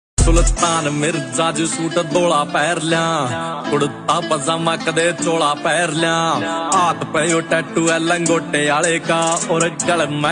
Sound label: Haryanvi song ringtone